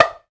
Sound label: TONG, African Perc; Spectrasonics; Supreme Beats; African Contemporary; Afro DRMs